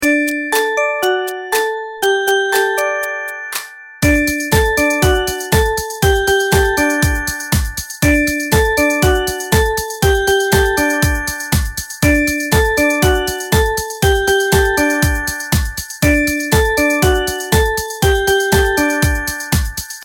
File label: Alarm Ringtone Playful Music